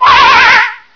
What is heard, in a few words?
race pain